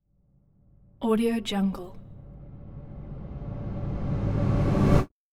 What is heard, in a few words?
air whoosh approaching